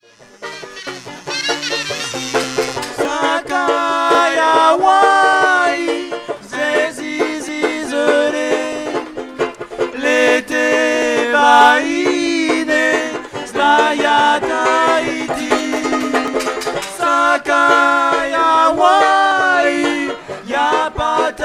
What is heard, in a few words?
folk blues hawaii